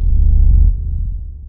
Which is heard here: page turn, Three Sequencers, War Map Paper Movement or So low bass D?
So low bass D